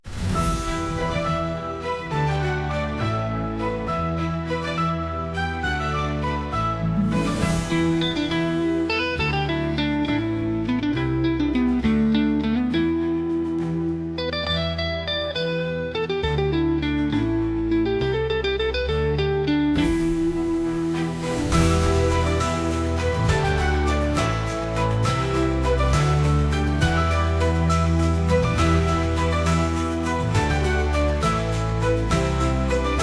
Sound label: duet epic Chinese soundtrack trailer